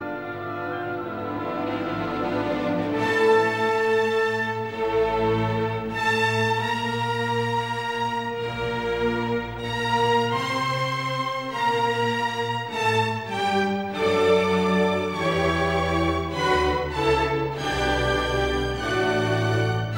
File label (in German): konzert fuer violine und orchester d dur op 77 allegro non troppo